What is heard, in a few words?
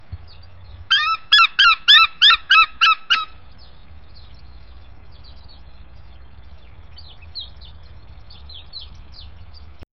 ducks quacking softly